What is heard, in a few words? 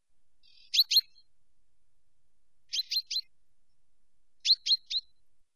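zyablik voice, chaffinch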